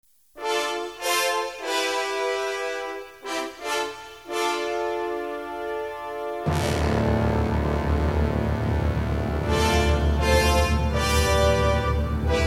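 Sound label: fanfare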